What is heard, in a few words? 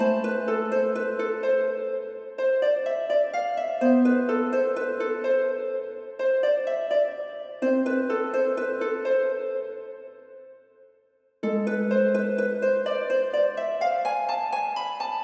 a minor cool hip hop harp loop 126 bpm; LOOPS